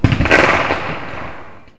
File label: Gunshot; Select Soft Publishing Stock Clips Multimedia Clips